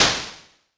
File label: death; Incantation